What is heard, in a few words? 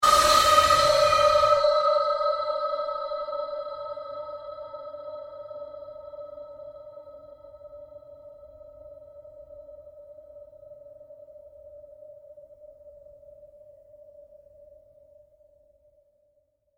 Created Drone Fade Long, Drone Sound Effects; sound building blocks created through manipulation of raw material